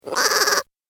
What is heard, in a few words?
Arcade Game Strange Animal; Video Game Sound Effects; Retro Inspired Sound Effects - Think Nintendo, Atari, C64